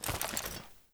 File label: g3sg1 cloth, weapons; svt40